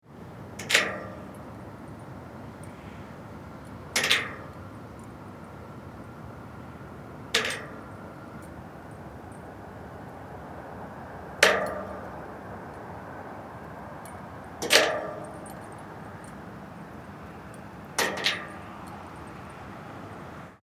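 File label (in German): Landschaft Kette schlägt an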